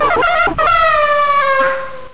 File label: alert, gonarch; svencoop; cracklife